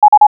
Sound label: test tone